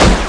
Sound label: SHOT WALL, PUMPGUN; WEAPON